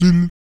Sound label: VOC PERC, Vocal Planet Jazz FX BASS PERC